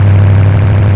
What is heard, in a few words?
low, engines; AUDI